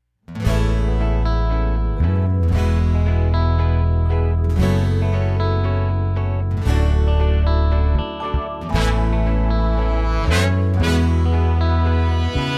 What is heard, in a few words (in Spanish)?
Hay un camino instrumental